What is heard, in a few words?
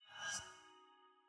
voicechat notif leave; premade voice